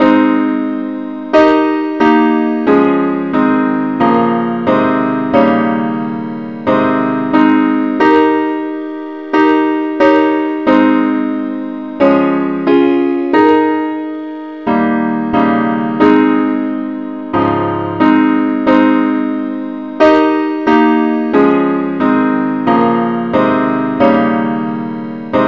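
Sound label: advance Accompaniment key A flat; Gwalchmai; Glory be to God on high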